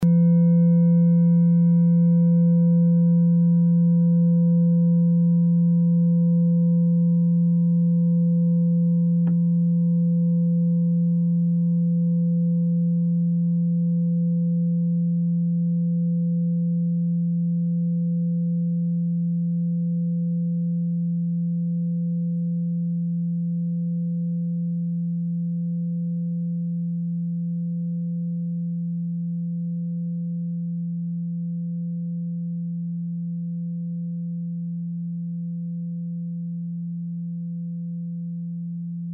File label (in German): klangschale tibet; Die Klangschale kommt aus einer Schmiede; Planetentonschale: Chiron; 1.Chakra (Wurzelchakra) und 7.Chakra (Scheitelchakra)